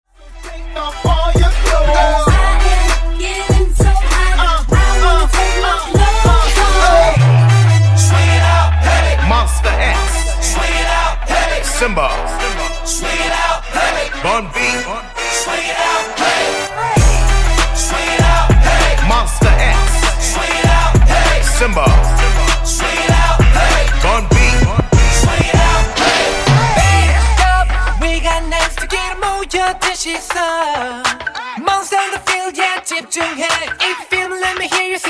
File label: Dj Intro Outro 98Bpm